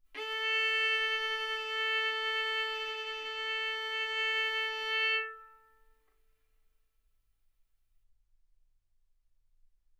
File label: Violin arco mf sul A A4 B4 stereo